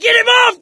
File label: scream while pounced, survivor; voice; source engine; left 4 dead 2